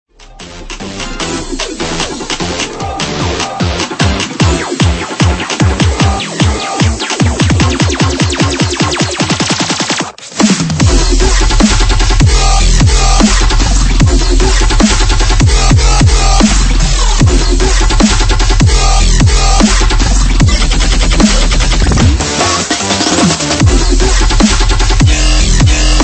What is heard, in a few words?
Dubstep Sound; Ghosthack; Arsenal